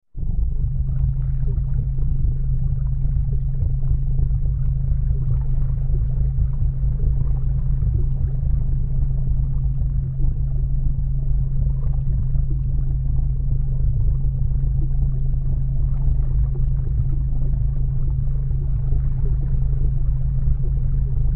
low hum with soothing waters with white noise at very low key